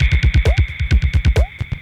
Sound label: drumloop; awe32